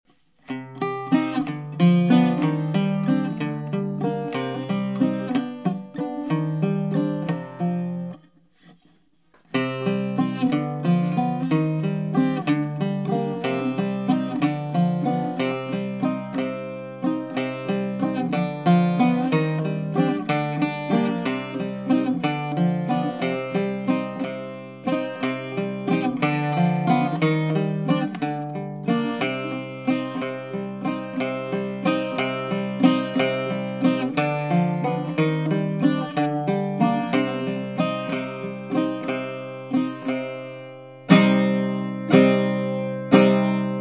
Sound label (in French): Accompagnement sans parole